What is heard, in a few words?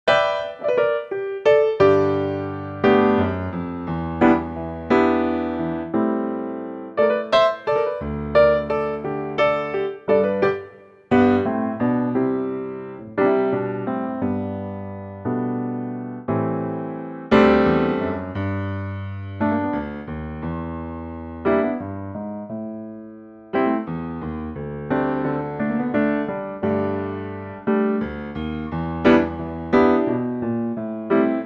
Piano; Pianotrak; key - G - vocal range - E to G